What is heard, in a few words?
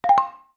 Rise, notifications; Samsung Galaxy S9